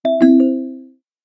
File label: dont think so